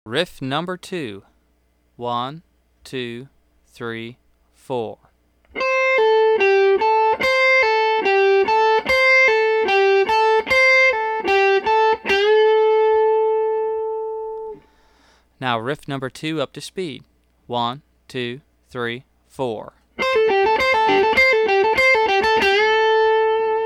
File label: Hot Licks Riff